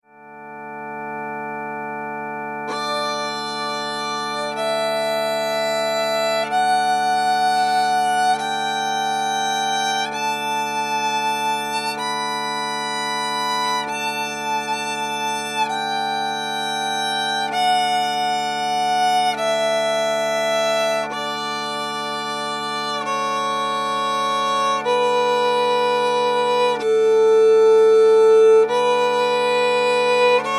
majeur

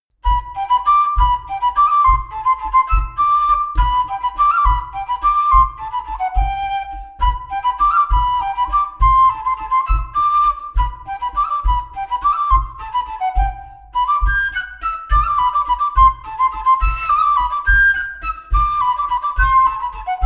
bourree d Auvergne

